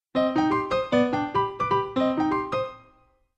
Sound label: Ringtone; win7